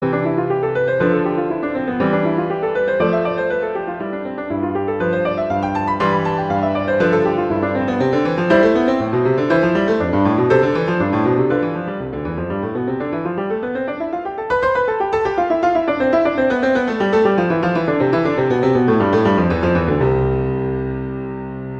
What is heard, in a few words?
Prelude V - C major; Clementi; Preludes